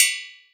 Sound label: meat hook, Bells